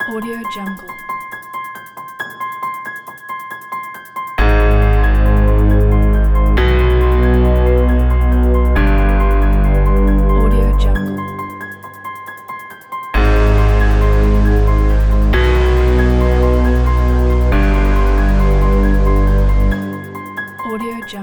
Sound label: horror movie theme